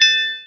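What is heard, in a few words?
bell start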